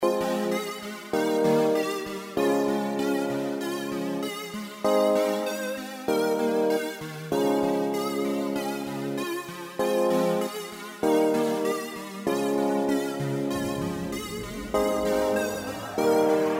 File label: Afrobeat